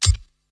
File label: reels start; DinoReels81WD; wazdan